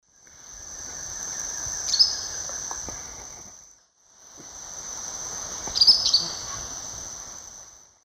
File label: Tyrannopsis sulphurea, calls; S. America; Eastern Lowlands